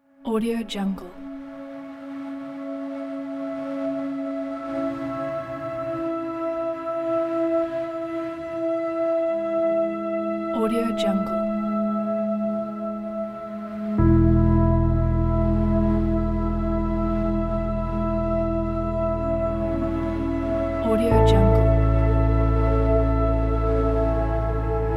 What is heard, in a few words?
calm background ambient soundscape